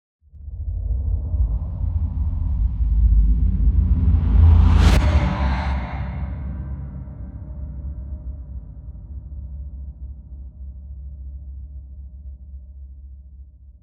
Just Transitions Creepy; soundbits

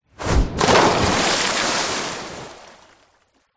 impact object fall whoosh into water splash

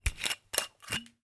drink wine bottle lid unscrew; zapsplat

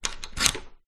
household door chain slide lock or unlock

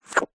animals lizard tongue eat insect

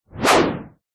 whoosh white noise harsh; sound design

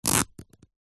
fabric cotton rip; foley; zapsplat

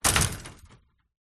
household gate metal flimsy close

